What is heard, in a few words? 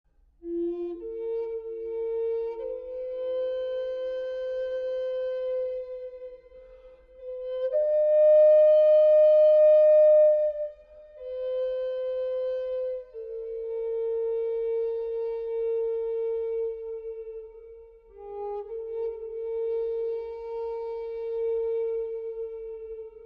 indian, indian flute